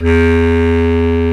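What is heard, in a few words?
Bass Clarinet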